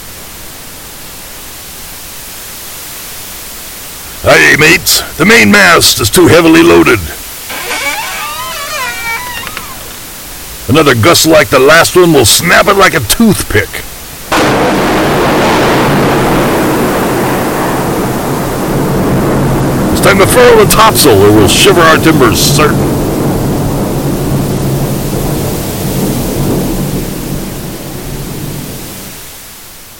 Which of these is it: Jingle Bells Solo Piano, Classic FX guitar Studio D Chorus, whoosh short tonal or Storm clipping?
Storm clipping